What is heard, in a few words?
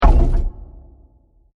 Heavy Wood Hit With Metal Particles; Blasts; Impacts; Crafted Signals